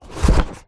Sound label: foley pizza box close; zapsplat